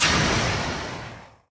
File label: tower rocket gunners takeoff